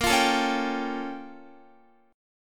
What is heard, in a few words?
Bb Minor Major 13th, Guitar; BbmM13; strummed; JGuitar